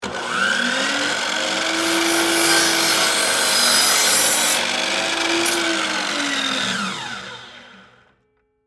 Miter Saw Cut Wood, Industry, Miter, Saw, Cut, 2X4, Wood, On, Run, Off; Saws